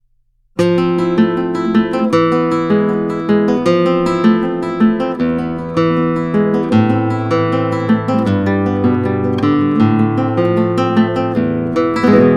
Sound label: rumba